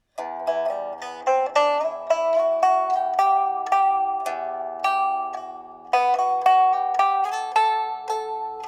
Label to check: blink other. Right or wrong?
Wrong